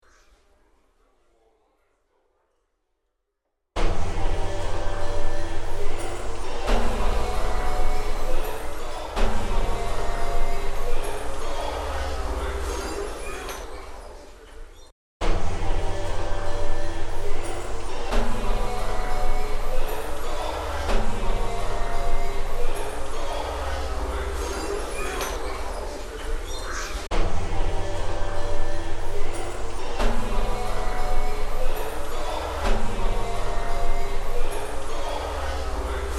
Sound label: Techno Beat Calling Flute